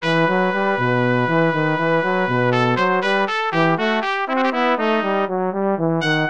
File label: brass trio; bach johann sebastian; aria tmolus from geschwinde ihr wirbelnden winde for